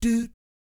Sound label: "doot", Jazz Fx, A#3; Spectrasonics; Vocal Planet Vol.3 Jazz Fx